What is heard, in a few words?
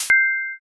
block clear